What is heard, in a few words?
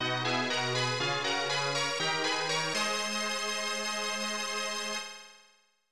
Join; SNES